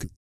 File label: EH REGGAE, Vocal, Blues Soul; Spectrasonics Vocal Planet